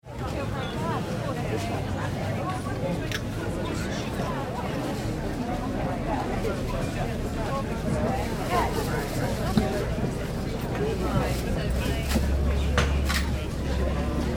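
Town Market Atmosphere - Crowd chatter - voices and activity; some close voices and activity; background traffic; Crowd Sound Effects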